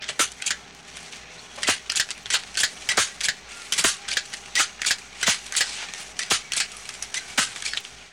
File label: Hedge Clippers